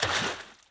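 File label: bodyfall water; foley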